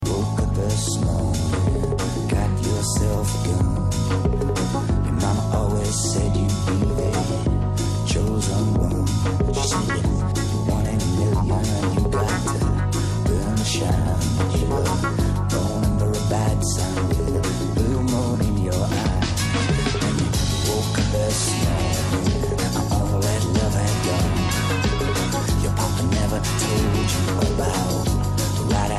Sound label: sopranos